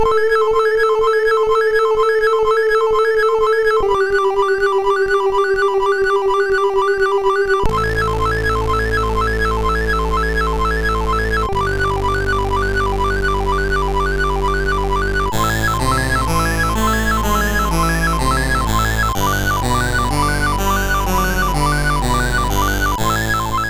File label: MOS6581R2; sid